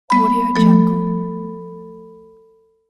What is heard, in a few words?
mallet lost ding